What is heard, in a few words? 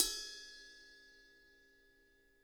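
ride bell, Drum Kits; Sonic Reality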